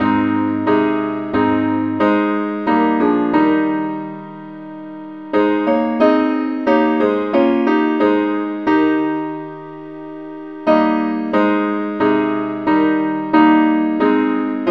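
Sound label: parts only, key A flat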